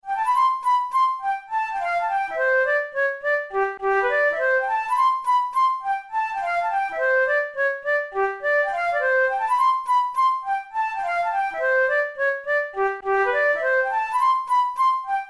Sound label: straight jig, flute